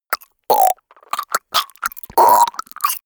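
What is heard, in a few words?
gag, body sounds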